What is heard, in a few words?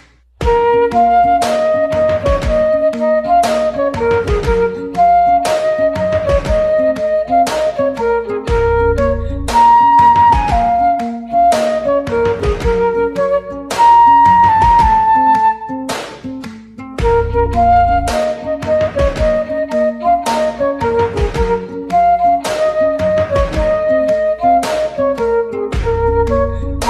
Vivo Flute Ringtone